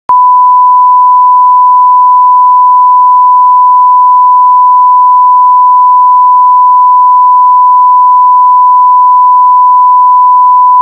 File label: 1KHz L+R ch